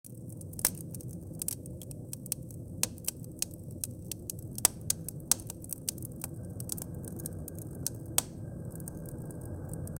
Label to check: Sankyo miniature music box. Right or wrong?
Wrong